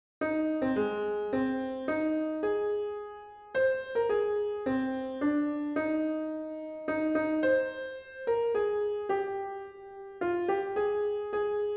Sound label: star spangled banner soprano